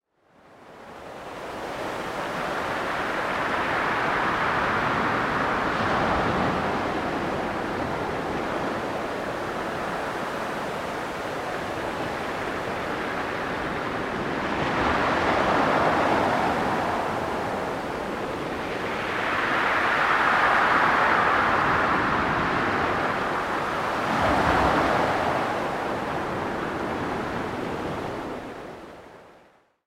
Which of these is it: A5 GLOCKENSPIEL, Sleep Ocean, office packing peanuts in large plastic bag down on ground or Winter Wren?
Sleep Ocean